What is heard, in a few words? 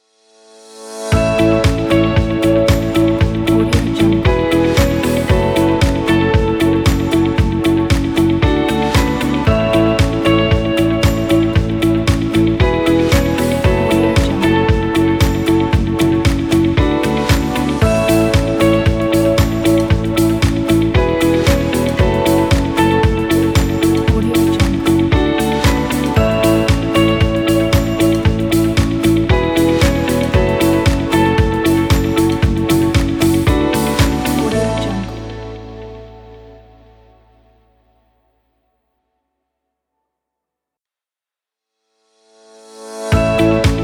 inspiring corporate intro